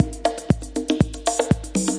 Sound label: conga dumpy kick, 120 bpm